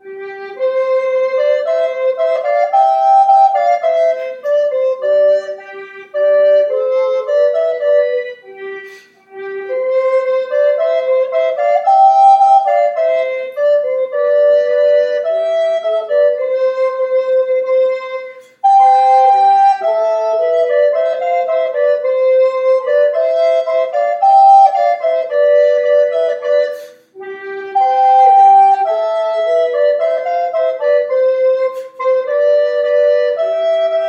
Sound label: Alto for Two, The Gift To Be Simple